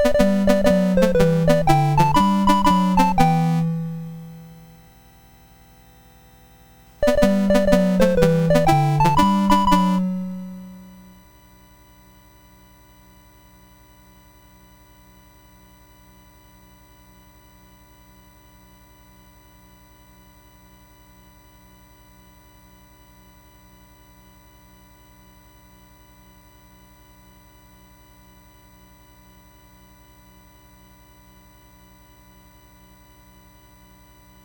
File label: Battle Hymn BASIC sid MOS6581R2